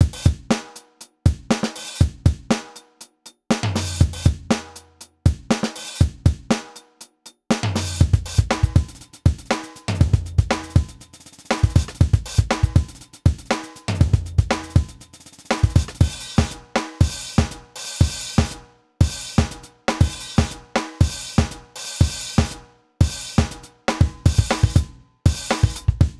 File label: Band Drums Funk Kit 120